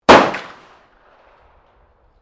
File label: PISTOL 357 AUTOMATIC FIRING SINGLE; Handguns, non military; Pistols